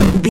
retro impact hit general

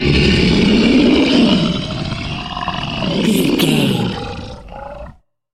troll monster growl long

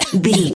voice female cough

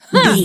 voice female attack

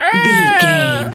seagul squawk hurt

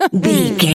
voice female expression emote

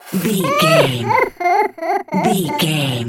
goblin fairy cry low

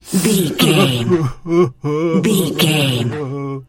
voice male cry whimper

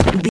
footstep dirt land

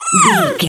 goblin fairy hurt pain

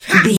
voice male attack

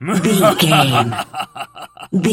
voice male laugh short

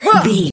voice female attack

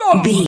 voice male hurt pain

